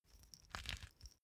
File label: small pepper grinder pressured glass ring; industrie-wirtschaft; gastronomie